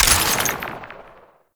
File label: Ammo Pickup Blast; Ammo Ability; Warframe; Warframes; Frumentarius